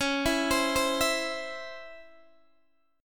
C sharp Minor Major 7th C sharp Guitar DADGAD

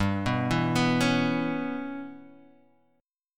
G 6th Flat 5th, Guitar, Open F; G6b5 arpeggiated; JGuitar

